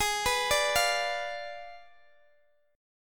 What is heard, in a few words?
G sharp Diminished 7th, Guitar, Open G; x,x,18,16,15,15